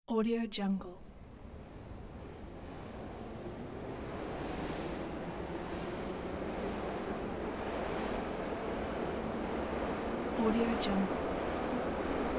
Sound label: subway